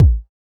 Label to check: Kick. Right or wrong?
Right